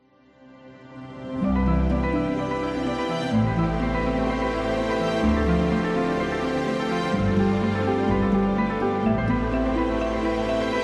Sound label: Wedding Cinematic